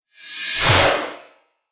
Cinematic Strike Rising Attack, trailer sound effects